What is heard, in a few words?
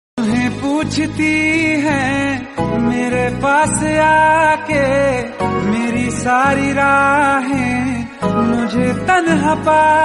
hindi song